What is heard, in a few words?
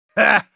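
laugh short, German; Medic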